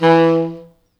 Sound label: E2, Pop Brass